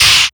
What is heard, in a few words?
Wah Fx; Sonic Foundry (Sony Creative Software); Esoterik Beatz